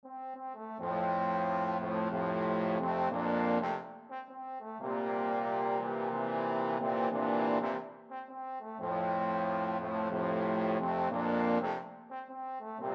whole world, trombone quartet

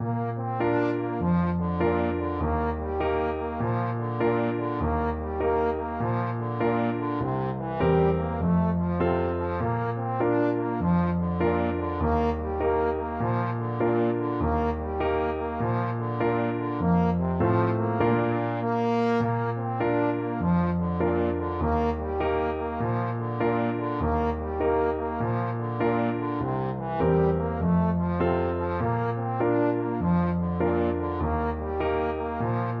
blackberry blossom, trombone

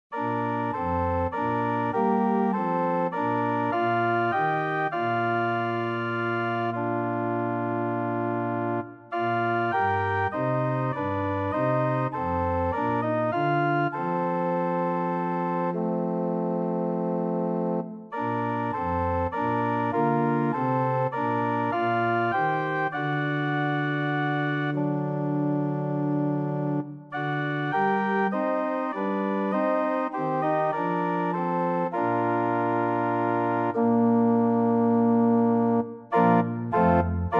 organ ped, organ

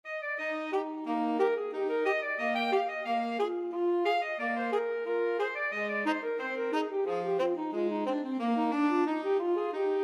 sailors hornpipe, alto tenor sax duet

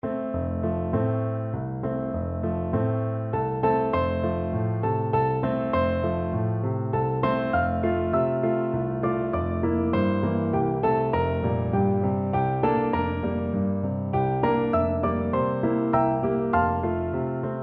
brahms lullaby, piano duet

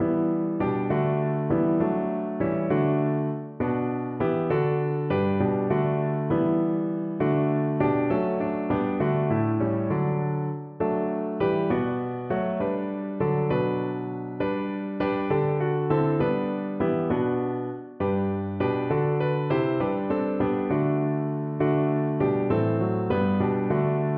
piano

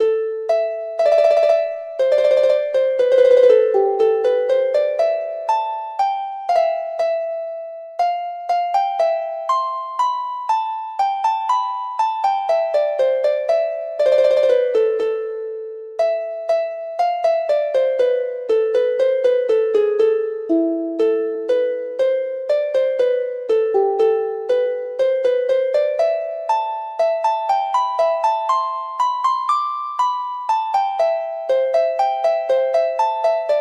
harp

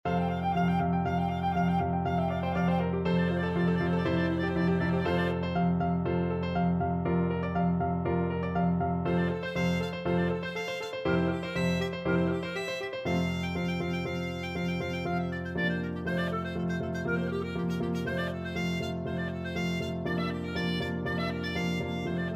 Capriccio Espagnol clarinet

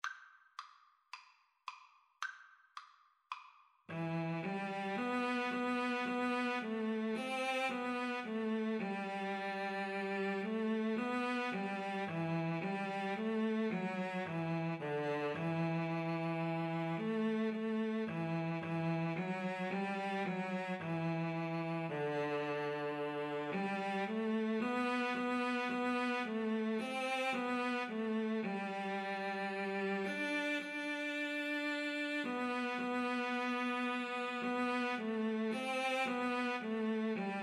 Cello Duet

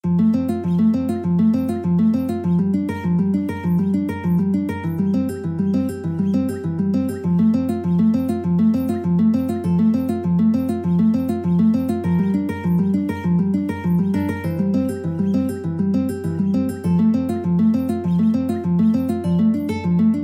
ave maria, guitar duet

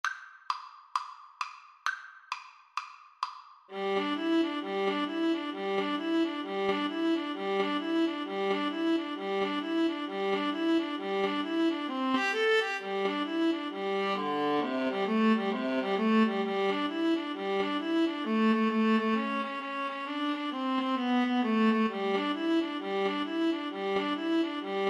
boogie viola duet

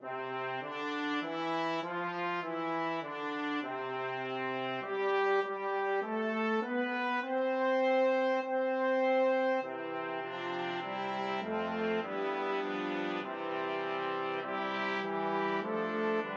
brass quintet

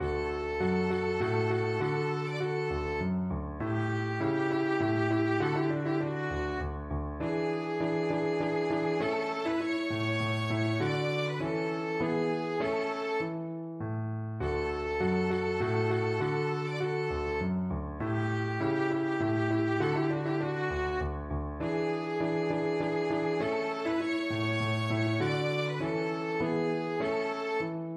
Minuet violin; from Don Giovanni

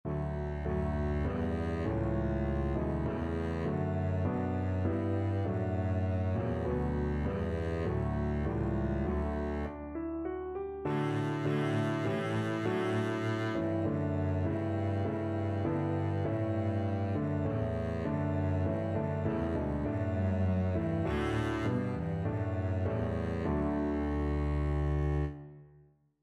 god save the queen double bass

